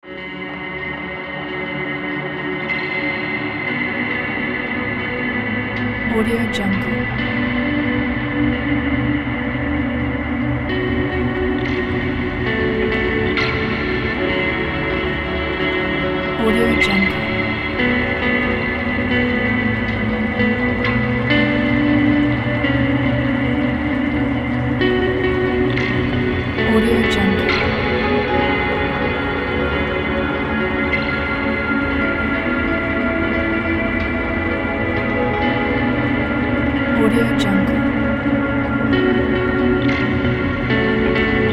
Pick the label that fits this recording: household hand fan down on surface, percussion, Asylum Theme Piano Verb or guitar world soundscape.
guitar world soundscape